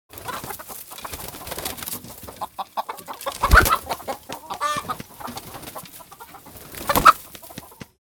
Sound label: Chicken squawking flapping wings; animal